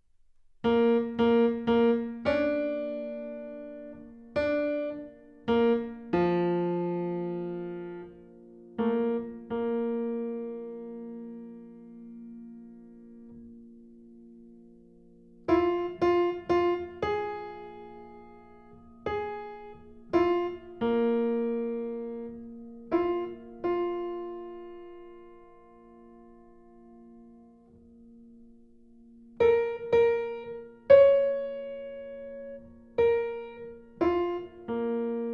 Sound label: Ballad